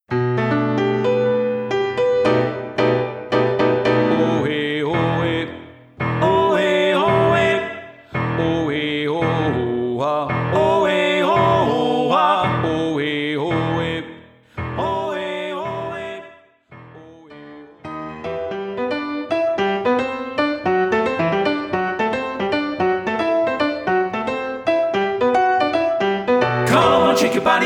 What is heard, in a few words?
conga rhythm